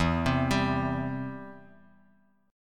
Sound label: E Minor Sharp 5th E down Guitar Open D